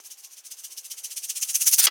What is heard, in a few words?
SHAKE; Houseworx; Drums; Perc